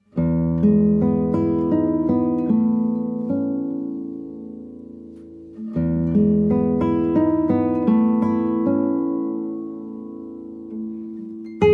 Solo Guitar

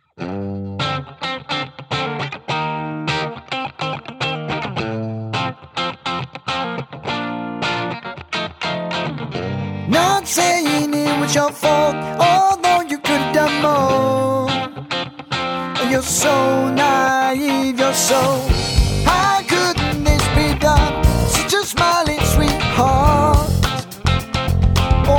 Rock

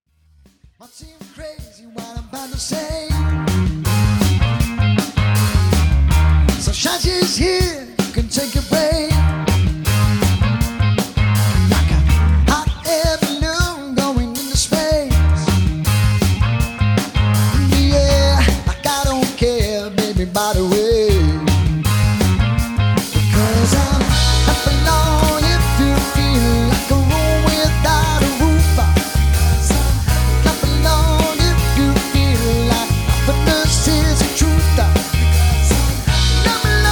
Rock, LIVE

